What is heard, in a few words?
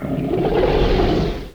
Yeti Attack